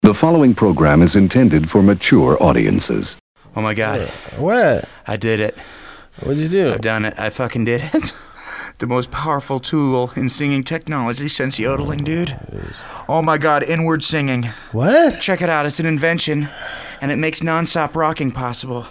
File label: Inward Singing Snippet